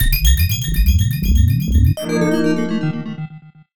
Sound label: Game Over; Star Fox